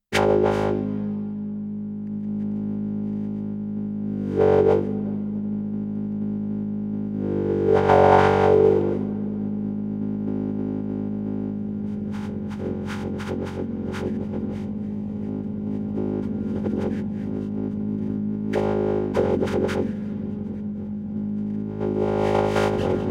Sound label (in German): Striso board Stromton externer Hall